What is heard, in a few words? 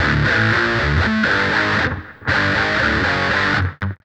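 Guitar (metal); Sonic Foundry (Sony Creative Software); Ugly Remnants VOL-2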